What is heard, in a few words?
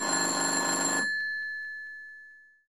telephone